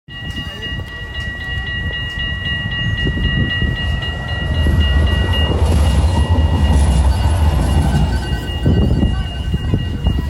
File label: Tram ringing, Rotterdam Field Recordings